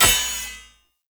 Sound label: Short Sword Medium Impact Metal Shield; Weapon Impacts; Player; Swords; Combat